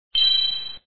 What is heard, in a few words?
poin; spaceman bobs great escape